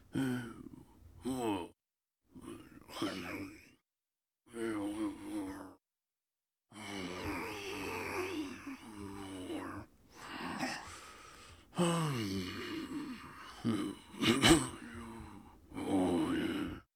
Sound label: Dock Flyer Sleep